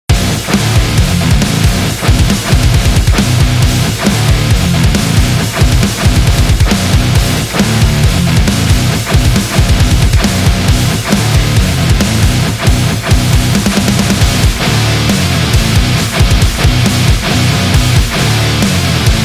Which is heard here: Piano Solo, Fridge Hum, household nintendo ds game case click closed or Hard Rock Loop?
Hard Rock Loop